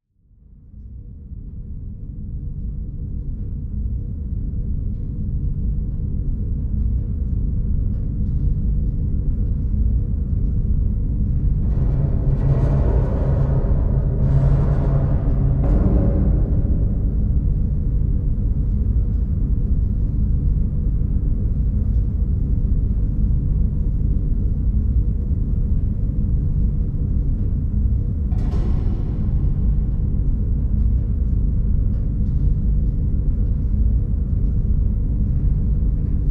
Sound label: Spacecraft Interior, soundscape; Death in Space